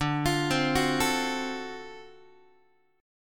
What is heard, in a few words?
D 7th Sharp 9th, Guitar; x,10,8,10,10,8; 4A,4E,5A,5D,5G#,6C#; arpeggiated; JGuitar